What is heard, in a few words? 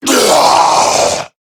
Death, Male